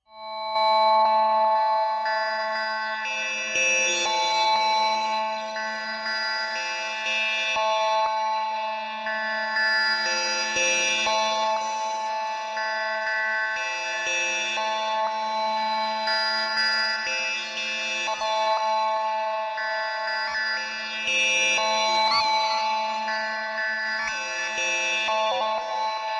Clock Nightmare, Machine, Warped Bells, Movement, Repeating